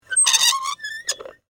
Squeak Handle Metal, Texture, Squeak, Handle, Metal, Device, Single, Slow; Squeaks